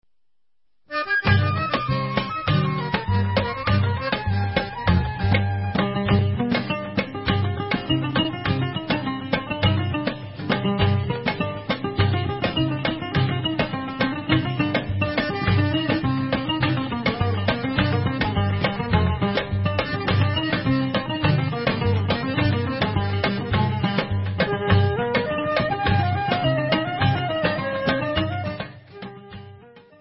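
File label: Instrumental, Israeli Dances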